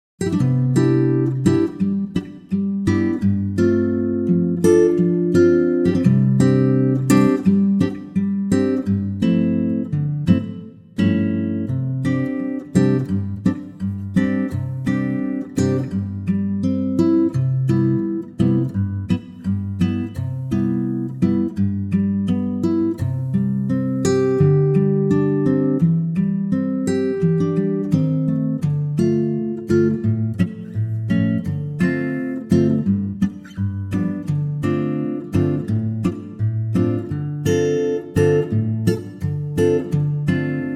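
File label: C Acoustic Guitar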